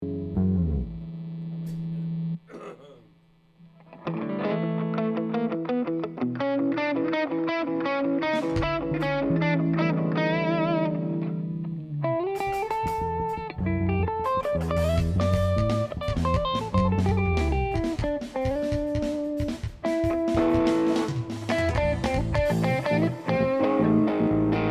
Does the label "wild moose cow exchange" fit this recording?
No